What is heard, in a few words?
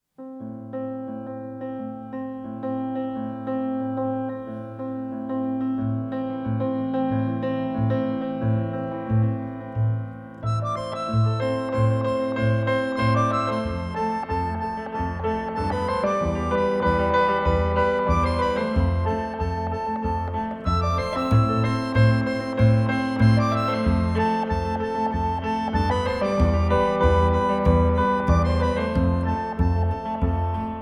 Guitar